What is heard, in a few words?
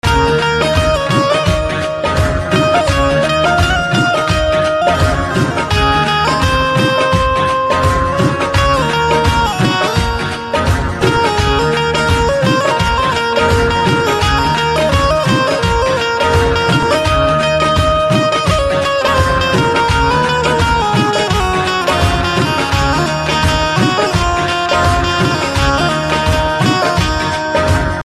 Banjo Ringtone